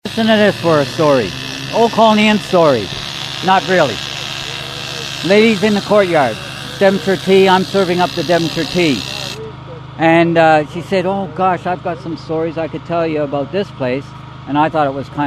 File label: Siren